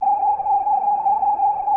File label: JUNO 60 E4; Hollywood Edge - Giorgio Moroder Rare Synthesizer Collection